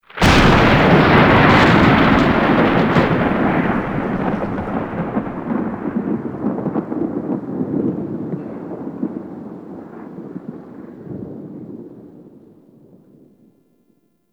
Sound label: Shipyards Storm Thunder; Exterior; Warframe; Grineer Shipyards